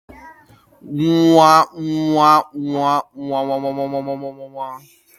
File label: wah wah